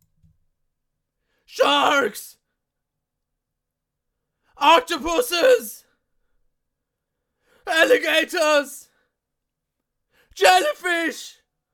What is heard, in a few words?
Scream Sharks